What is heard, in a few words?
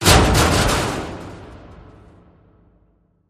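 Hit Organic Metal Collision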